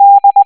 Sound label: d tone